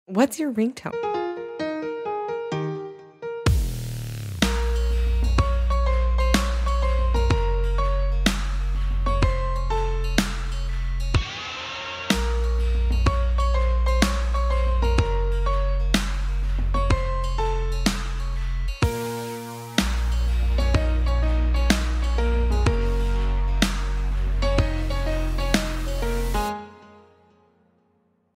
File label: instrumental remix ringtone; tribute